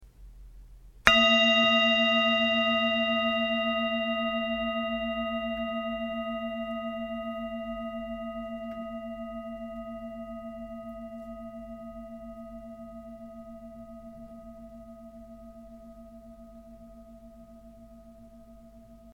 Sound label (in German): Klangschalen